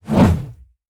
Whoosh Rod Pole; SoundBits; Just Whoosh 3; Whoosh Essentials

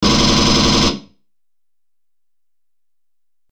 M41A Pulse Rifle; FXHome; Bullet and Gun

